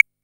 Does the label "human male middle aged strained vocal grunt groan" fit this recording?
No